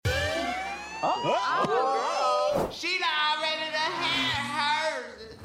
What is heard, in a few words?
Siren